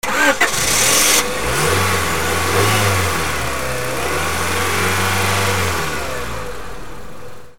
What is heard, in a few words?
CARS